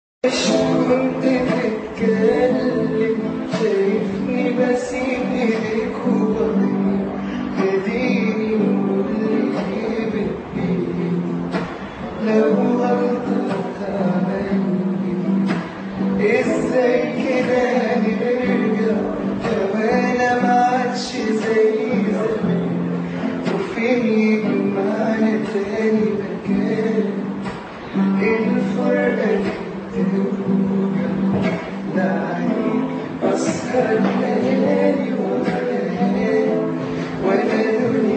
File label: guitar; egypt